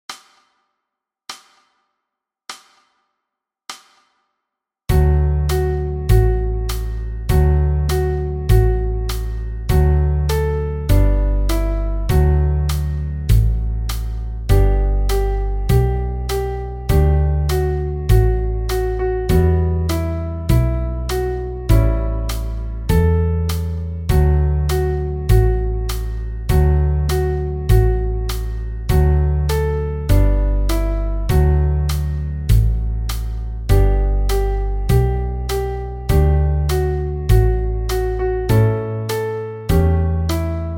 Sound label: Jingle Bells in D